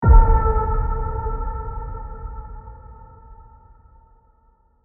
Ping A

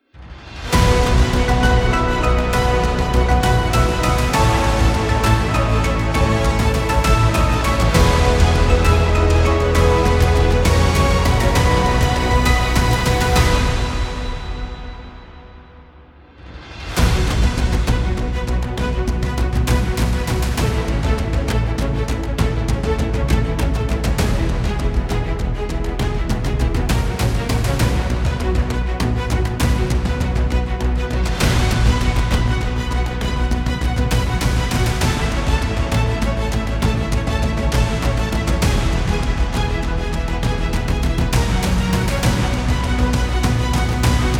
News Sport

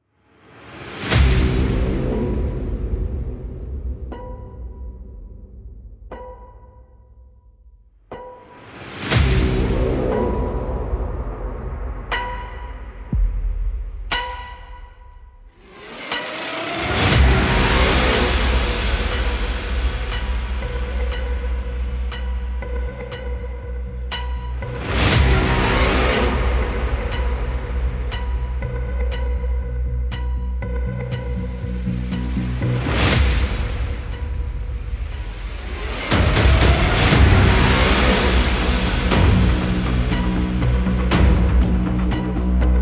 Heavy Load

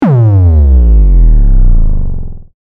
Downer

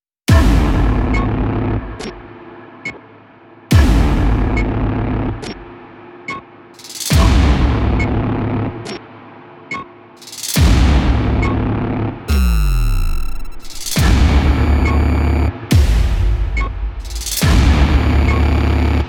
Exotic Shot

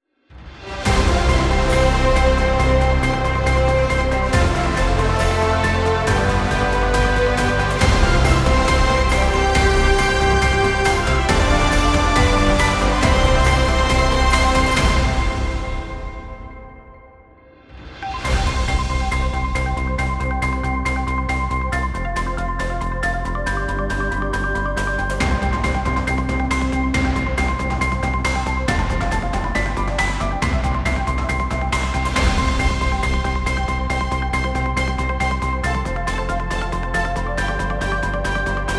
Fast Start News Sport

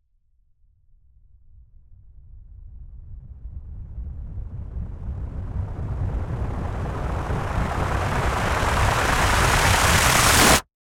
Suckback

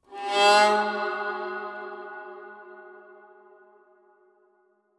Viola

